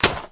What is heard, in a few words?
break